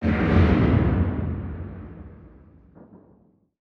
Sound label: distance explosion; weapons; Stellaris